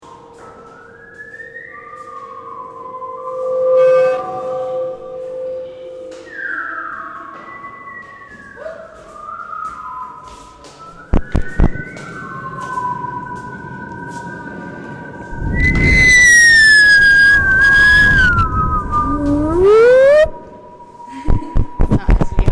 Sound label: Whistle Jam, Audience participation; excerpt